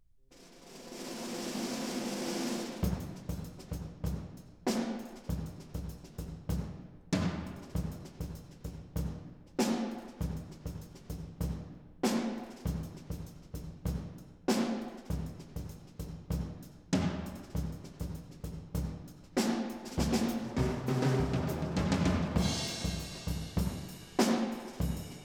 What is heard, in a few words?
Percussion Imaging Tests, Drum 30 Feet, Live Studio